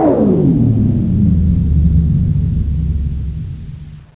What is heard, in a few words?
power fail; items